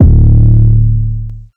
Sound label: hip hop